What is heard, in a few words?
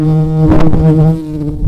Lapidarius queens, bumblebees